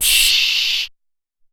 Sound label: Vox Hiss